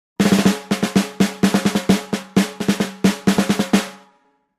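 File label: Drums Snare March Accent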